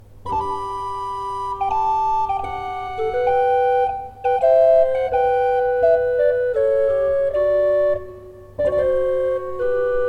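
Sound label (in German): Tzschoeckel 5 I, pfeifenorgeln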